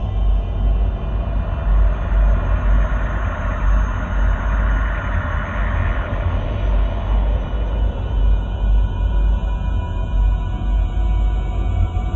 synthqueen ship starbase idle; Stellaris; machineage

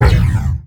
laser fire, weapons; Stellaris

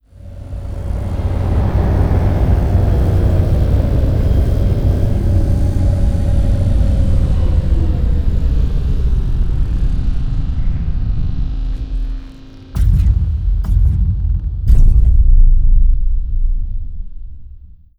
sfx star eater closing; Stellaris; nemesis

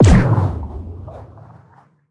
scavenger bot missile; Stellaris; distant stars

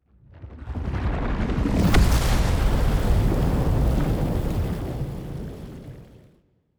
colossus planet hit; aquatics

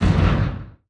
distance torpedo fire; weapons; Stellaris

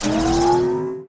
ui queuing district; Stellaris

